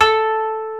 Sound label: koto A3